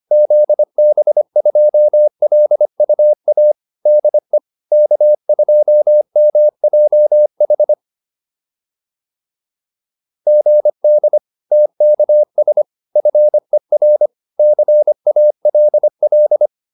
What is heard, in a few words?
QSO, advanced practice files